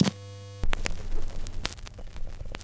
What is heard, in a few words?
Akai MPC2500 noise